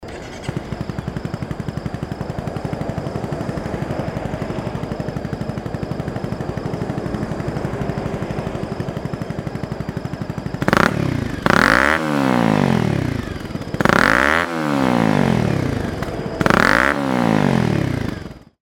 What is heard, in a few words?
crf250rally